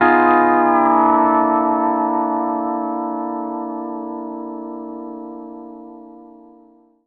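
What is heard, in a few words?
pedal steel chord C6 9 L; PEDSTL CHORD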